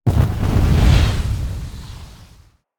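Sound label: Fire whoosh Fast Fire Burst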